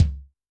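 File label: bass drum room, Kick, Yamaha DTXpress-II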